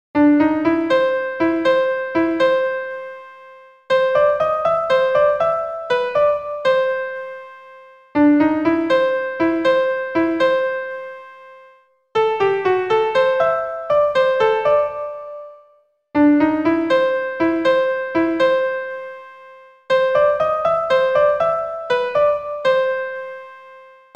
The Entertainer, melody only

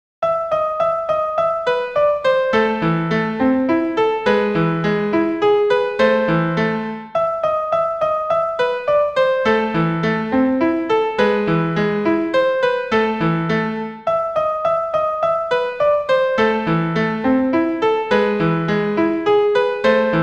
Fur Elise (easy and slow)

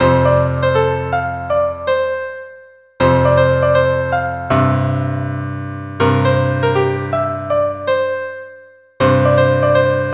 piano with simple chords longer version

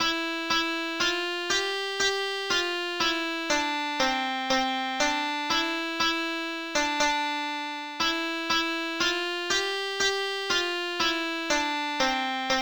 Ode to Joy easy guitar

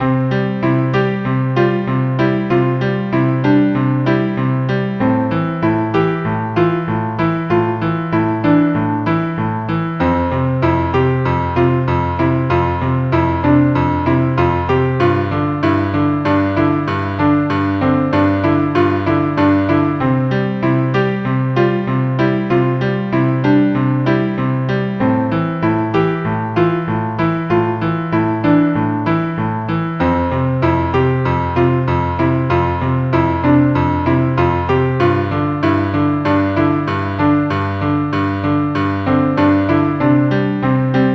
96 bpm

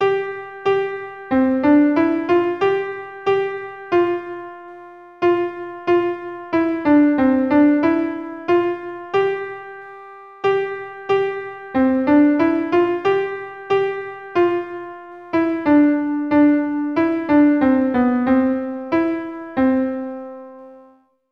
92 bpm

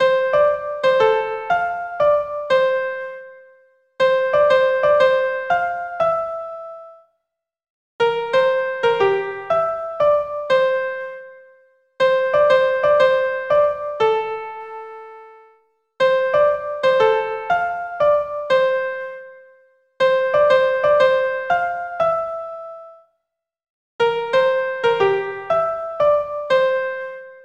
melody only 120 bpm

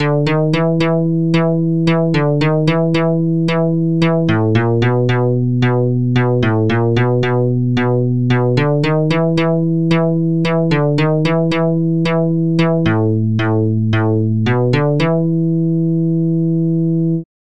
guitar

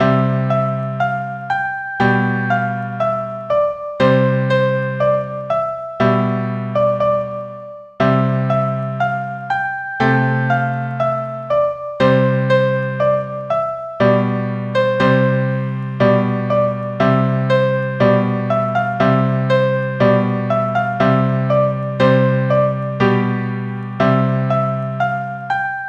Ode To Joy - piano - easy L.H

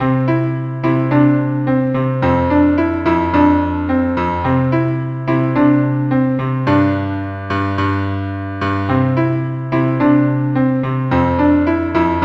Rock Tune

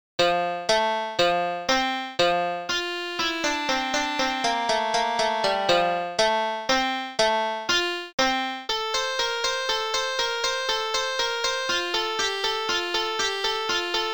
guitar - 60 bpm; Invention No. 8

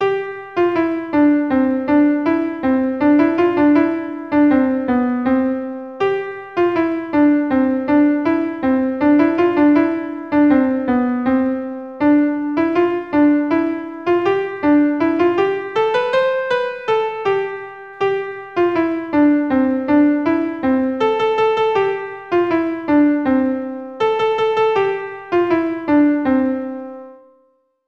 melody only; Deck the Halls

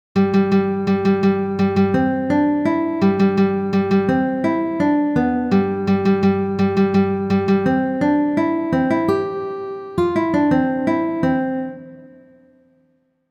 easy guitar; William Tell Overture

